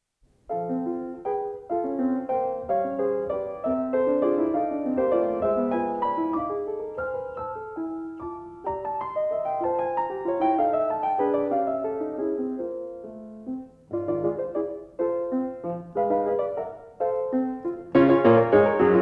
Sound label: 3 Pieces pour piano